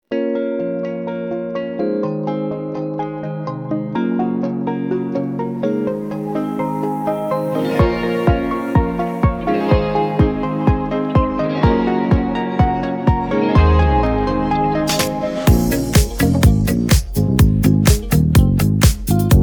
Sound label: instrumental, persian